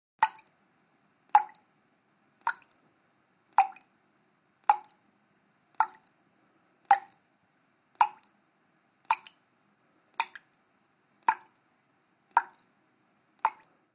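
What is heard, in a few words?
Water Drip Steady, drips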